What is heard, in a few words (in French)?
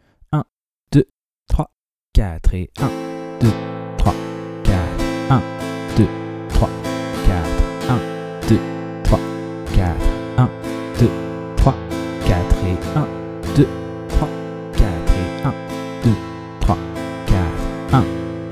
moyen Travailler la rythmique rapide